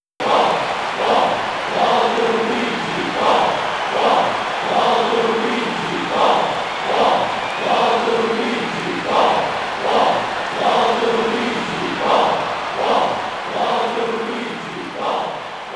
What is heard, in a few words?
Crowd Chant; Mario Strikers Charged Football; wii; Waluigi